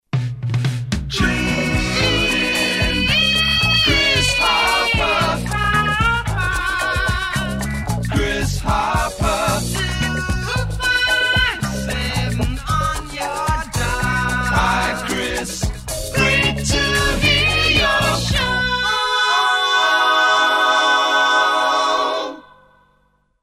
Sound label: Breakfast Show Jingle; Swansea Sound 257